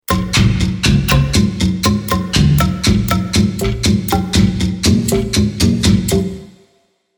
Tribal Stinger, Tribal rhythmic ethnic percussion Stinger Intro; Stingers; Sound Design